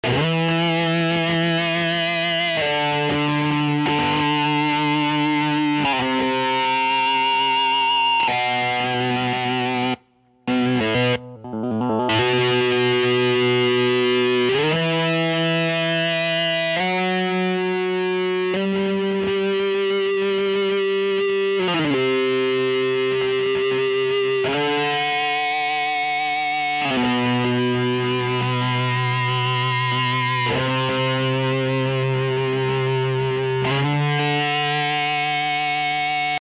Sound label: Feedback